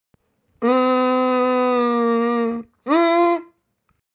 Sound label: Mmmmmm